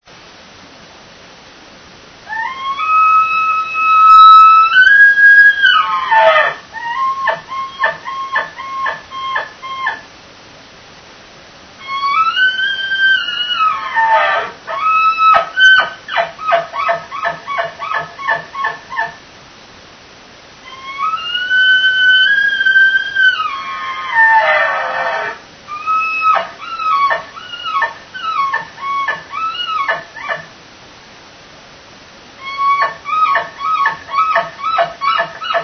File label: bull, elk; elk contest; elk 2001